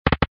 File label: REELSET STOP; Sizzling 6